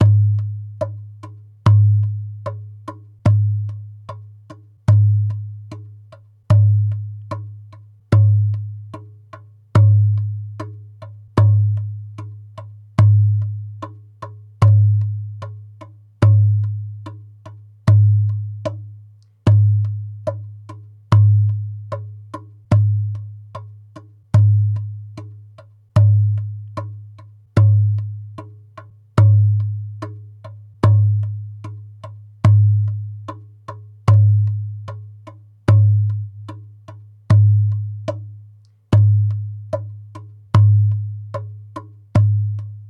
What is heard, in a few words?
Djembe 4 temps 68 bpm; Djembe 4 temps 74bpm; Accompagnements Boogie